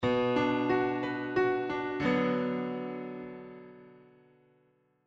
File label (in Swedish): Tonartsgivare Bm